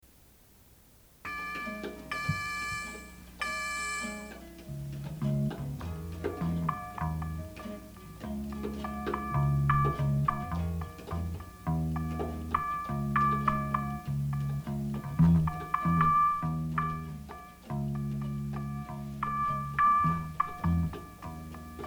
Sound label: bells